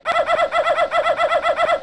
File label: turbo woof; Animal Sounds; Various Animals; Short calls